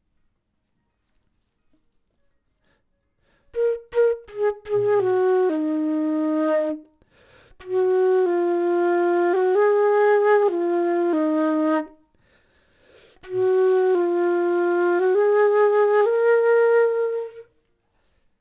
flute; logic

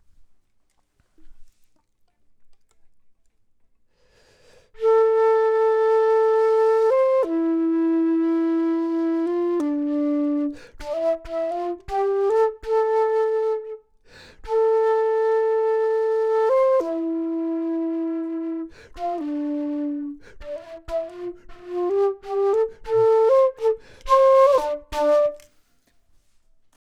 flute; logic

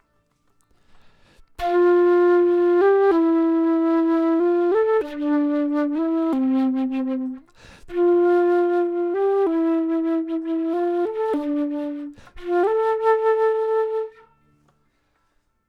flute

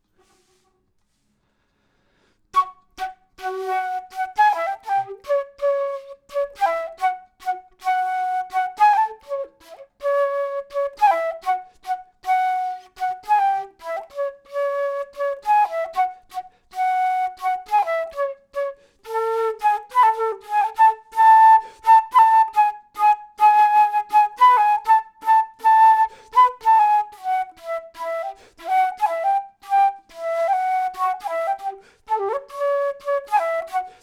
flute intro; logic

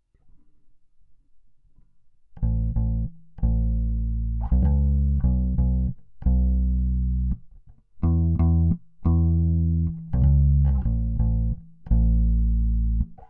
Hi-Fi DI

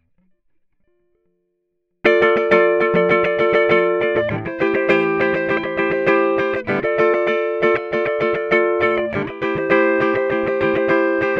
Small Bright Combo; logic

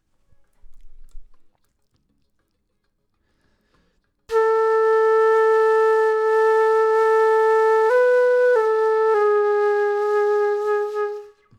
flute; logic

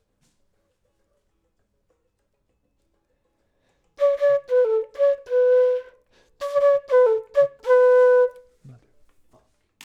flute; logic

